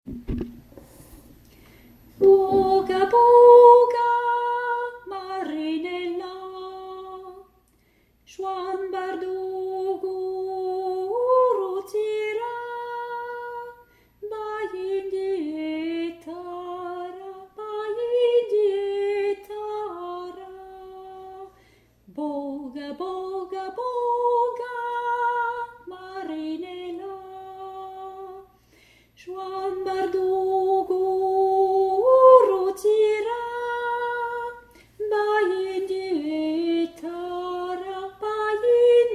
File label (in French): boga boga tenor